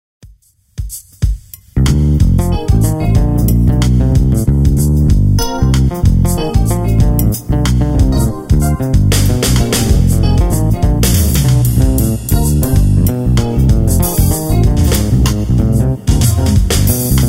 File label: peavey